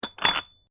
Weights Dumbell Remove Weights, Gym weight lifting equipment